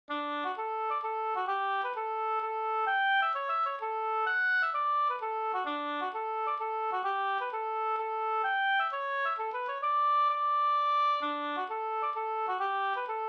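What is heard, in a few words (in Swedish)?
polska Glotelaten Hälsingland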